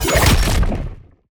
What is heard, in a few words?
attack jump heavy; bloom minigame